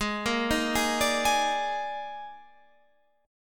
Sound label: D sharp Major 7th Suspended 4th G sharp